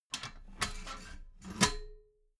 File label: metal ladder small handle fold soft